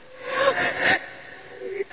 female scream

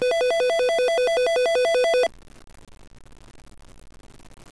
phone ring

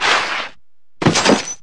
junk fall

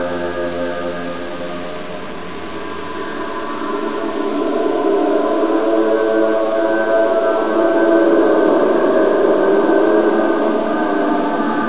amb

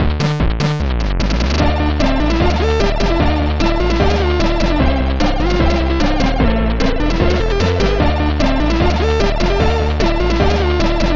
keygen song

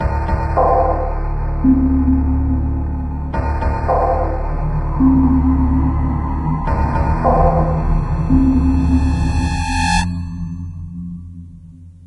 evasion, music

